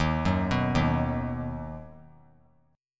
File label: D sharp Minor Sharp 5th D sharp down; arpeggiated; D#m#5; JGuitar